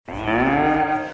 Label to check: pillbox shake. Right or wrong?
Wrong